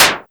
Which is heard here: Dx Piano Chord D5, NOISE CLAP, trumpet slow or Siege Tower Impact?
NOISE CLAP